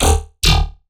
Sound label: Riddim Bass Hit E; One-Shots; Bass Hits; Wormhole; Ghosthack; Riddim Essentials Volume 2